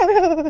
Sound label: panting cold; yoshi; SM64 CO-OP